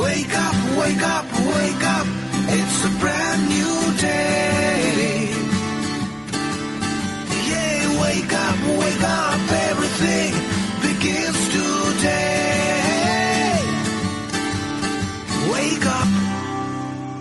micromax wake up alarm ringtone

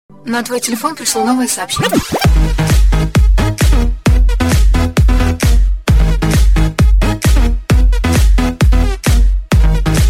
SMS MIX RINGTONE

